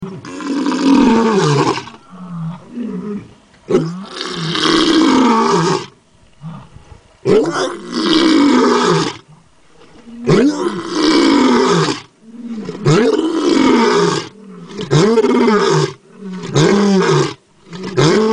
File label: Lion Roar